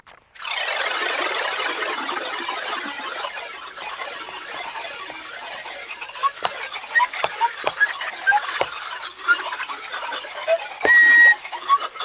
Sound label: Shaking Panpipe Cannon